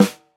Low Acoustic Snare Sound G Key, steel snare drum sample tuned to the G note